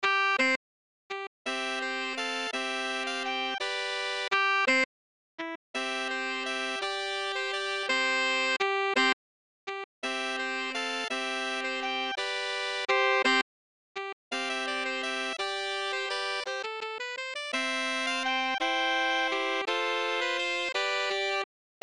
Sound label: Sax Trio; William Byrd Suite